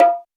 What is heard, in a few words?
Roland U220 Dry Set; 90 s Sample CDs; 300 Drum Machines